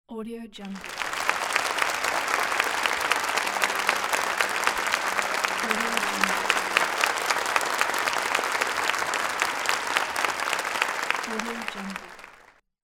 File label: applause